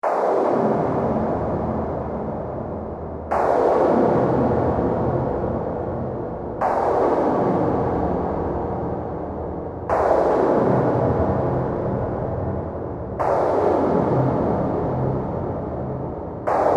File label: Drone Scifi Horror Crashing Pulse Throb Surreal Heartbeat; Sound Design